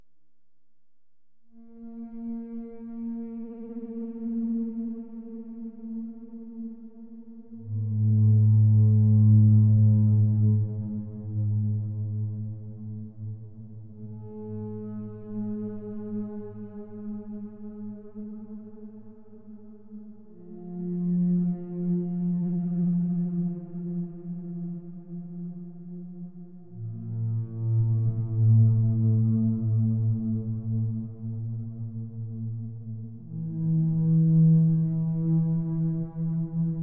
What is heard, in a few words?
noise; january